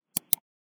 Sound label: mouse click; everyday objects